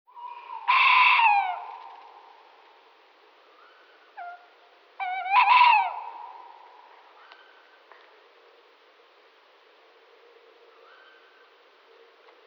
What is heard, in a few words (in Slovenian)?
Lesna sova